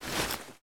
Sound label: clothing up